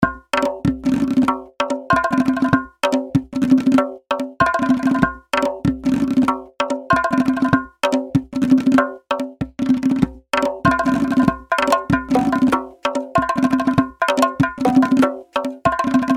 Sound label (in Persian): لوپ پرکاشن های شرقی